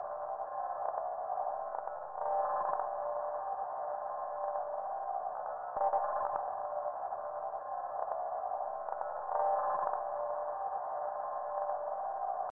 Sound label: Camera Ambience; five nights at freddy s 2